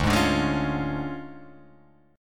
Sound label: D Minor Major 7th Flat 5th, D